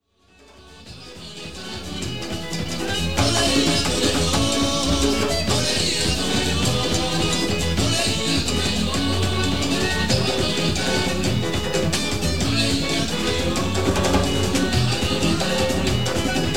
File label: Zouk, Abidjan Cote d'Ivoire; ethnomusicological recordings; world music; AFRICA 1987 - 91